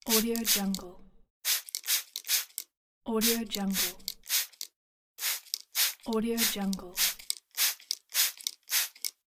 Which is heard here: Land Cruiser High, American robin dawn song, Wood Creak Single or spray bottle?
spray bottle